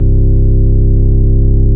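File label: CX3 overdrive C3, ORGAN; Sonic Reality; Vintage Timetraveler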